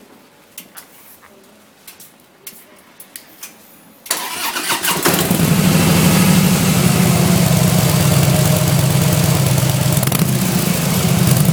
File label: HD Slim